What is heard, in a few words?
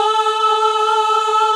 GOSP. AAAHH